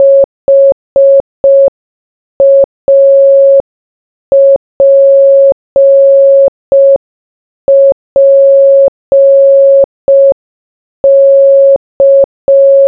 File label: Happy Birthday Morse Code Sound